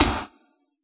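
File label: snare drum HipHop, Yamaha DJX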